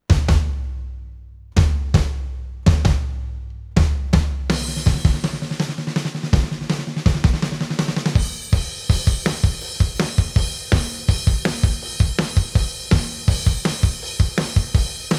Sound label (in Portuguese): Bateria depois; Click Turbo